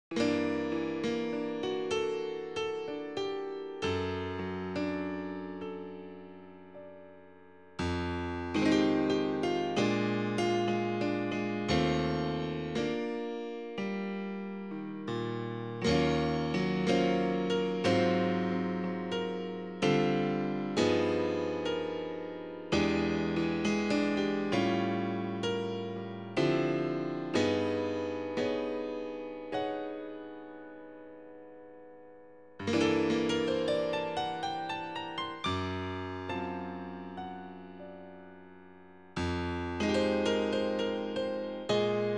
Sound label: marine jazz; PS2